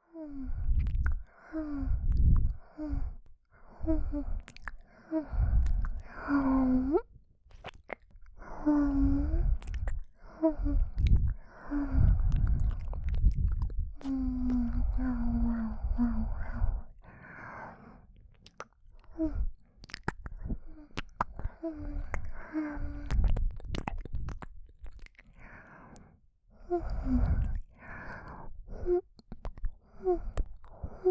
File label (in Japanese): ゆっくり耳を包み込む はむはむ